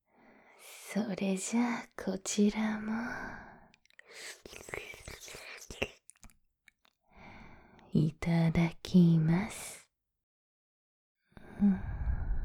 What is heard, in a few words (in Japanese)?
耳、舐め、舐め(右耳─耳舐め)